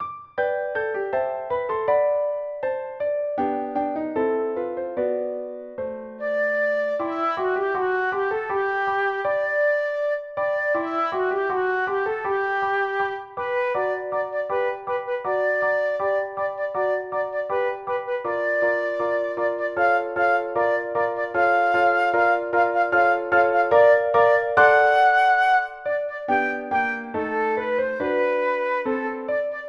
My little doll for flute synth; Flute, piano

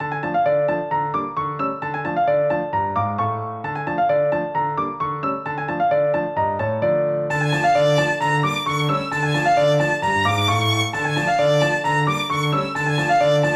Russian; Violin, cello, piano; synth

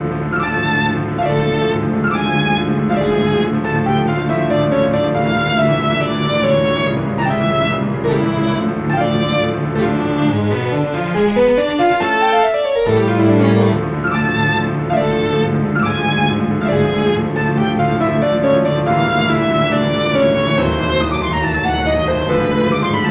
Melodramatic for struggles; Violin, cello, piano; synth

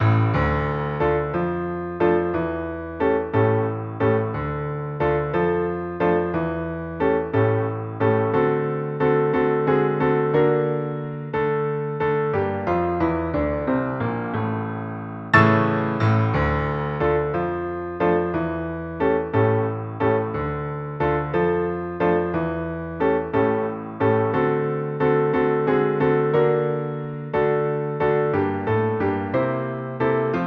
Comic Music; synth

